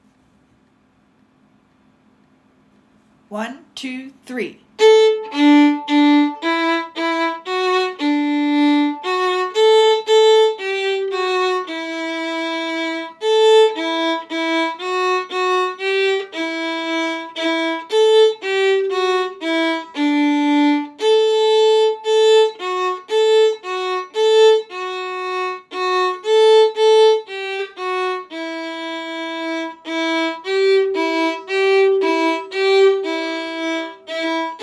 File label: Dreidle Song